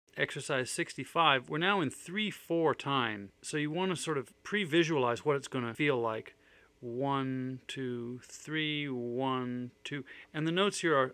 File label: 3/4 Time